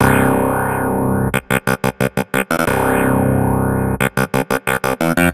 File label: Fat Wah Clav